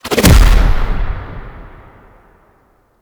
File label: Bow Equip Hit; Exalted; Warframe; Ranger